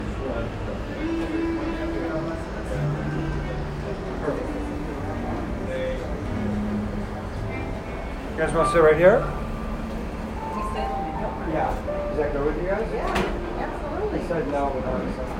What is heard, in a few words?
Restaurant